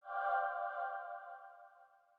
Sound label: select item zoom; witch hunters stolen beauty windows gamerip 2012